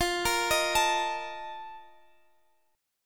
A sharp Major 7th Suspended 4th

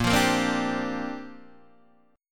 A sharp 6th Add 9th, Guitar DADGAD

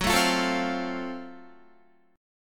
Gb Minor Major 7th Flat 5th Gb Guitar; strummed

